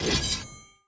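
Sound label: sword movement out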